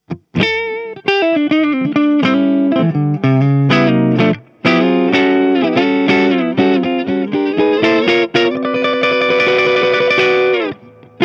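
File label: clean ES335; Bluetone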